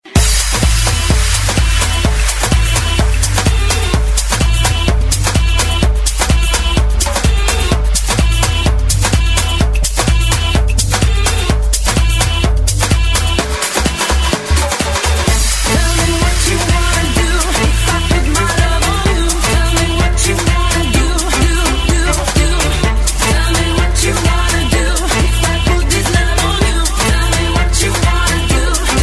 Club Mix

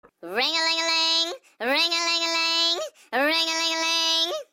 Ringalingaling

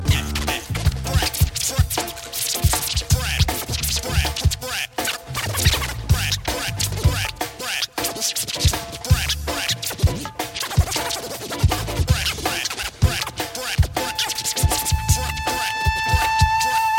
Dj Scratching

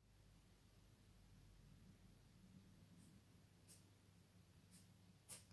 Rain fall

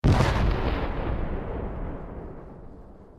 EXPLOSIVES

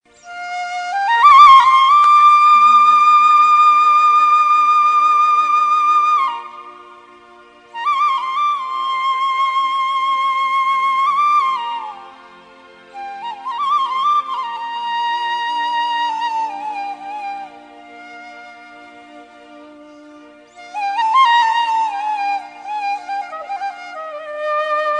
Marvelous Flute

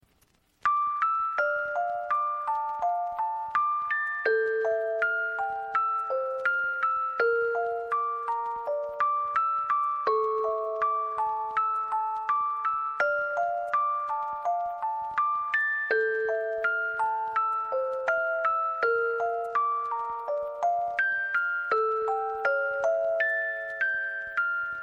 Music Box

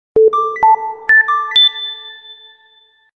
Tone Msg

